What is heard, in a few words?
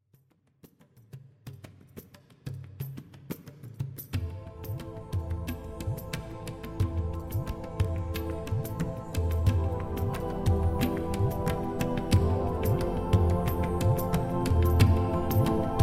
Om Mani Padme Hum